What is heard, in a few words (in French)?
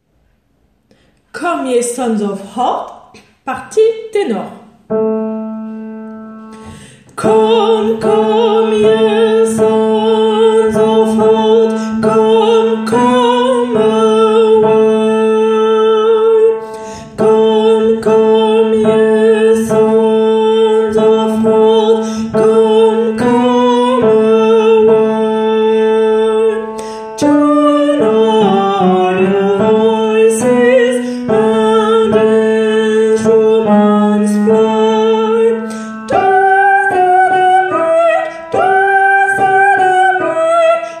ténor; Come ye sons of art